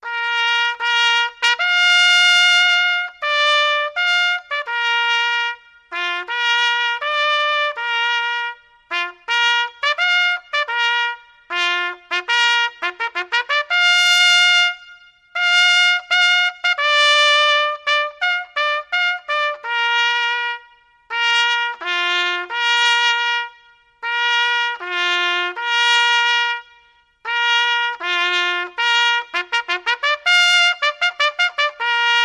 Retreat Solo Bugle Call Reverb

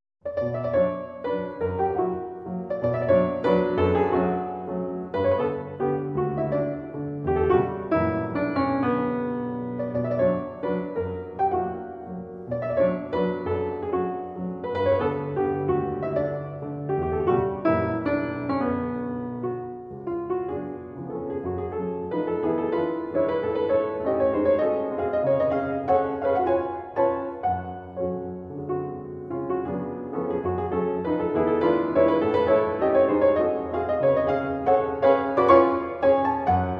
Mazurka in B minor; Op 30 No 2

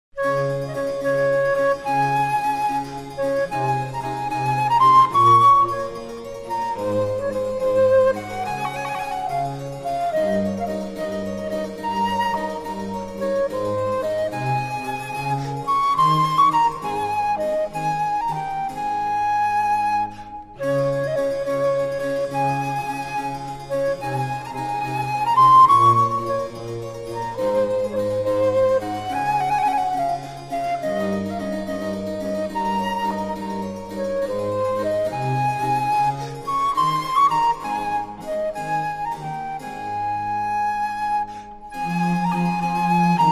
Concerto in D major for traverso strings & bc; Il Gardellino; Antonio Vivaldi

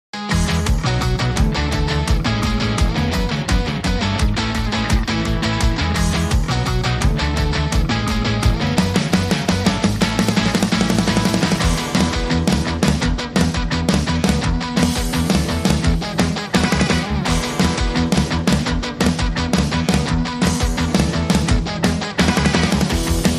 No Phaser Guitar

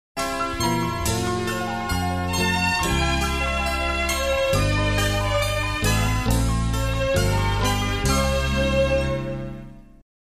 Auld Lang Syne, Small Orchestra

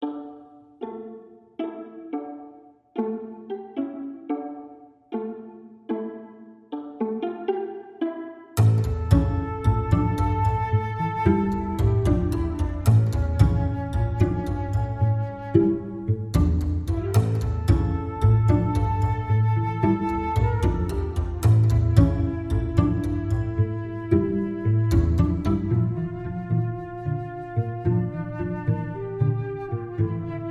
Flute Pizz

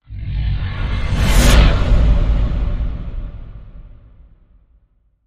Yeti Roar Flyby

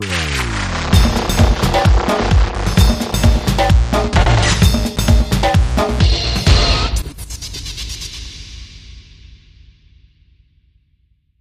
House Of Noise Tribal

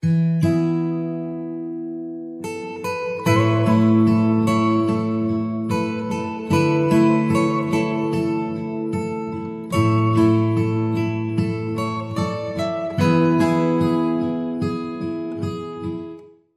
Bright Sunny Afternoon, No Drums

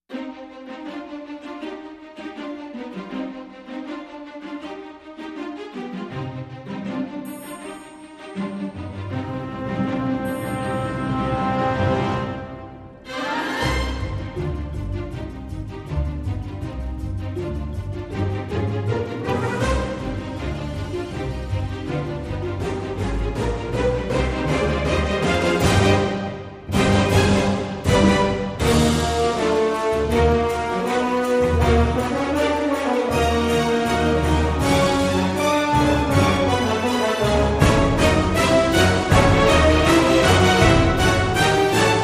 No Choir

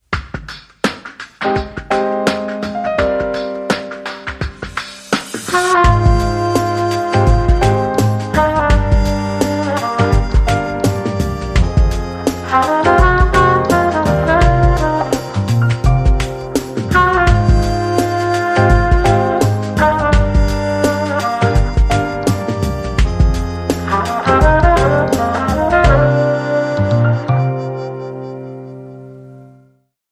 Cool and Funky Jazz

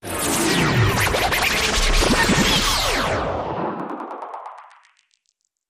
Fluttering Chaos, Taylor Grand

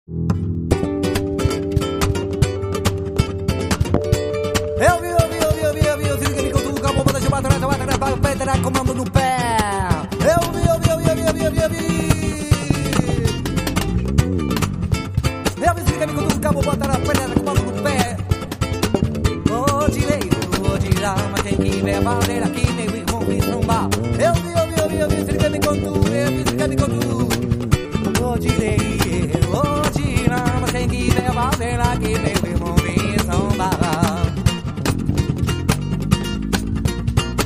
Snail Funk

